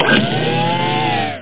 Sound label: robo; vcop